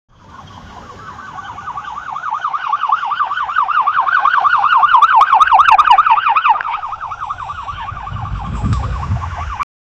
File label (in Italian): suono di un auto della pol